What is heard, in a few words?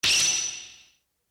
japan sword